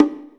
Percs

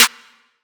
Snare Generic

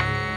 Synth

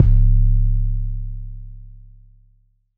808 C#

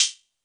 HAT, Closed Hats

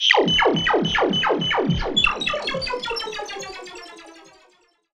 FX

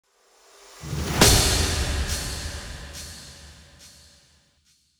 Impact

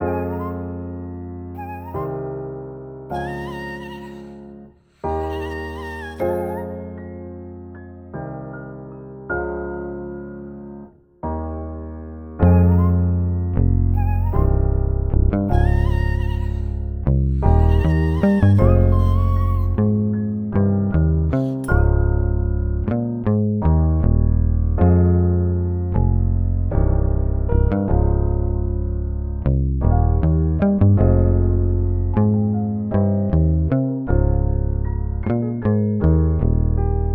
155 melodic loop F; sentimenti

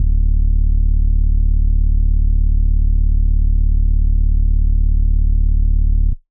motorsport sub bass

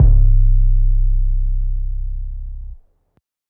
808 D; TCE

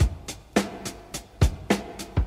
106 BPM, big band

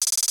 HAT ROLL, Closed Hats

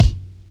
kick one shot slamming; Kicks

